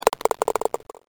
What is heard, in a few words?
golf ball